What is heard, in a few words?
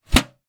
industrial tool box metal lid close; zapsplat